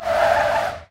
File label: squeak; War Thunder